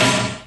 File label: PERC; BWB UPGRADE3; BALL